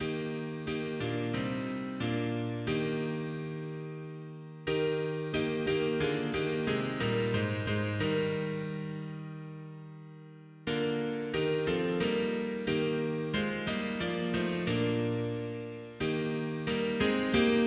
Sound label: mono piano; hymns; Himnario grande